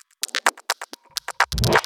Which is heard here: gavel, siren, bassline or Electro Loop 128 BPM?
Electro Loop 128 BPM